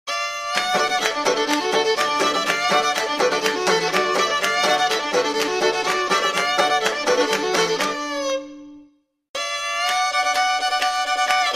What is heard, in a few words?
Square Dance